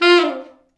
T Sax falls, Horns Sax

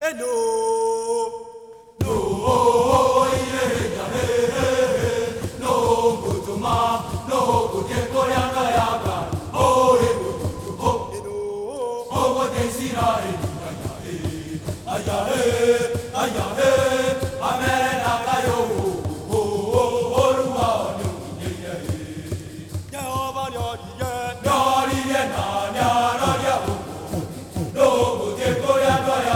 Heart of Africa; Spectrasonics; Heart of Africa 2

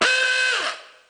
Horn, cartoon Foley Fx; musicradar; cartoon caper

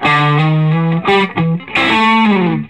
Vintage Blues Guitar, SOLO

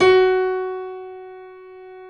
GB3, YC7 Piano mf

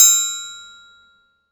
Triangle Lo Open, Perc; Akai MPC500; LA Perc

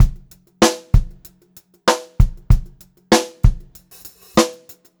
Pop-Rock Drumloops; 96 POP 2

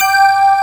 bell G3, hip kits

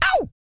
YELL, MALE HUMAN; Spectrasonics Vocal Planet

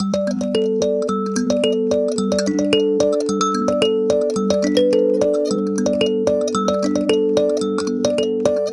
Kalimba, African

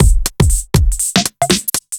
Kit 2 Heavy Swing 120; off the grid

